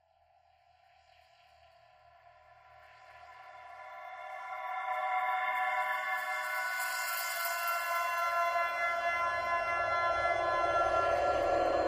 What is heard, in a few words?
Horror Drone Bed Eerie Swells; Scary Tones; Sound Effects:Horror